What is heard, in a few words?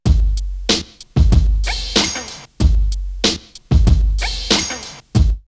6 Strings 94, drumloops OldSchool; X-Static Goldmine